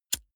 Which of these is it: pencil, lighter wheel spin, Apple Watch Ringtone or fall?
lighter wheel spin